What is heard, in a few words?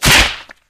slap hit; tf